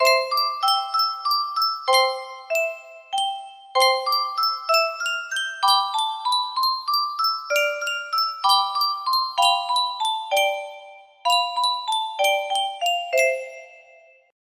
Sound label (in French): Yunsheng boite a musique